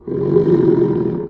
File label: peek look, antlion guard far; hl2